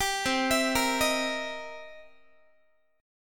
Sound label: C 7th Sharp 9th, C, down, Guitar; C7#9 arpeggiated; 22,20,19,20,19,x